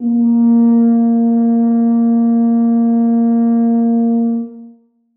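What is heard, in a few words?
tuba sustain a#3; Sonatina Symphonic Orchestra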